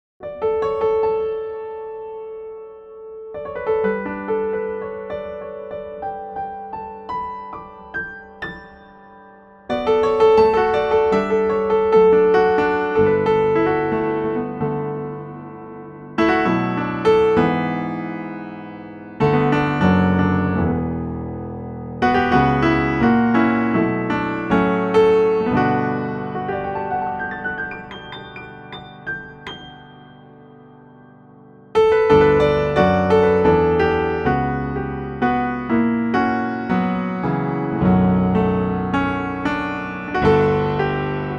IMPROV RAMANCE POP (POP LIVE)

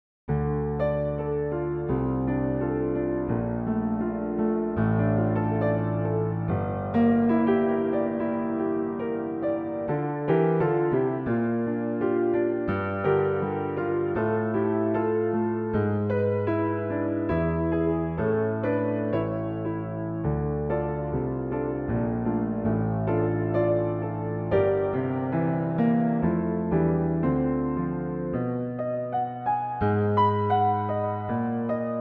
Soundtest, tone color of virtual piano

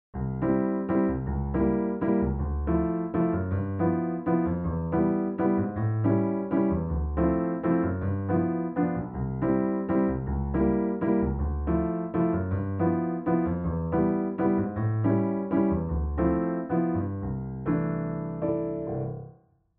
Piano

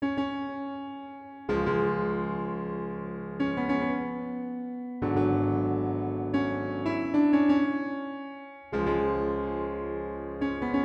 Room Mics; Hungarian Rhapsody

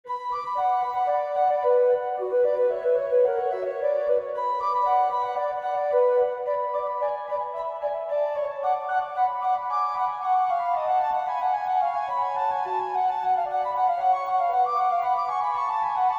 Orchestral suite, Organteq; Badinerie

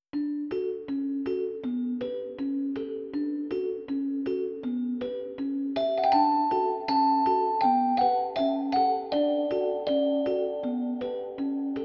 Celesta; Children Corner

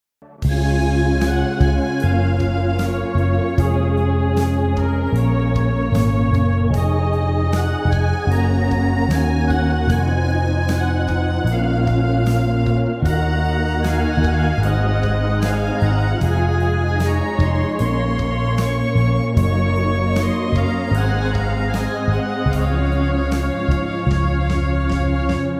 Organ hammond; Organteq

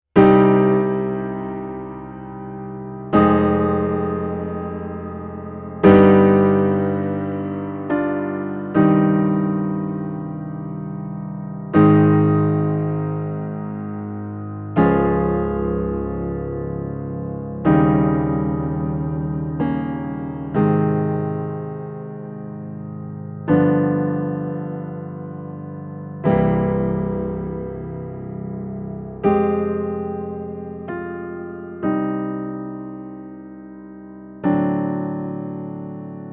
Closed Lid Live Wide Stereo Loud Steinway D

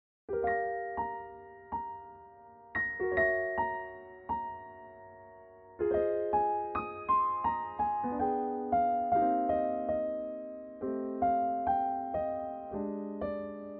Pop American D

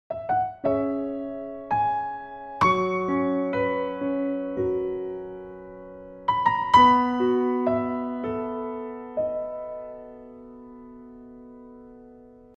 hammer test ivory steinway

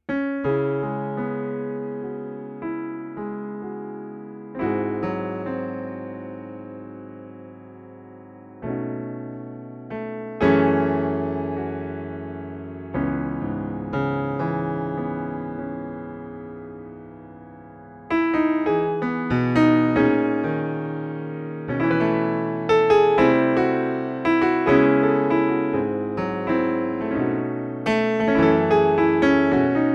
Steinway D NY Studio

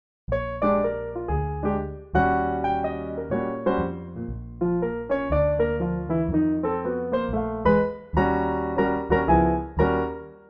bass piano split

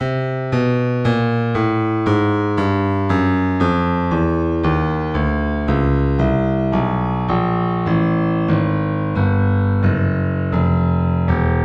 Bass Chromatic Scale

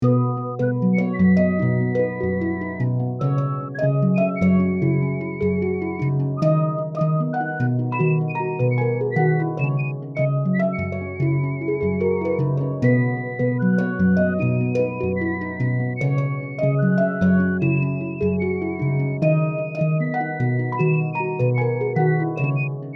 Space Drums Natural Hands

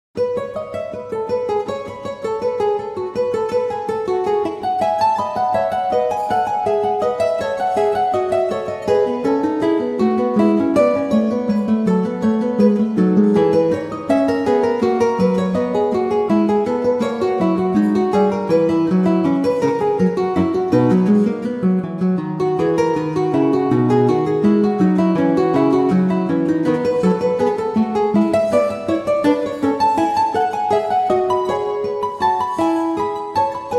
Two dirty guitars; Bach BWV 952

